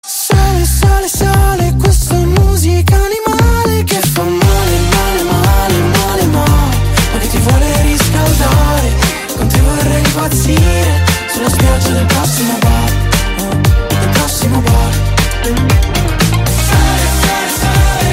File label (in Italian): musica animale